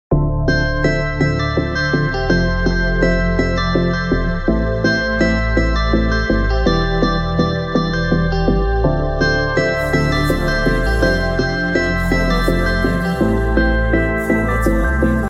suomipop

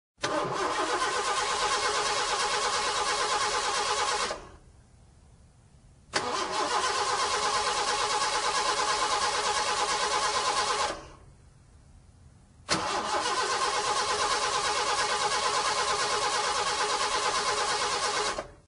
romuauto

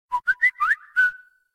samsung galaxy s3 pilli

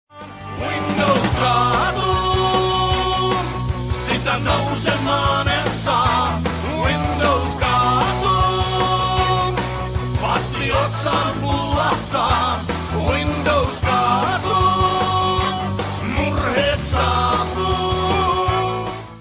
windows kaatuu

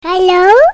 hei sms

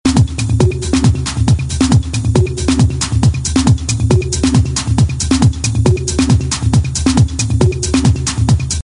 elektroninen

